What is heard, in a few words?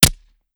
shoot; tacz; daffas arsenal